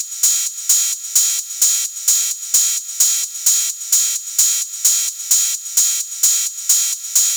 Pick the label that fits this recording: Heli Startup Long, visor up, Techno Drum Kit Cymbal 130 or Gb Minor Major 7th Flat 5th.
Techno Drum Kit Cymbal 130